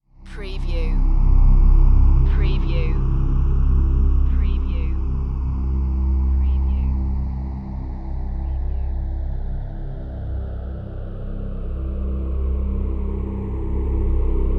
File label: Drone Synth Sweeper; Sound Effects Bible